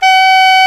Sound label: SAX A. MP, SAX Alto Short